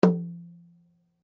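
Drum Flat Skin mezzo forte, Drum heads isolated from drum shell; Musical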